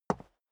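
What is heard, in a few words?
step dress boots concrete single right, footsteps; schritte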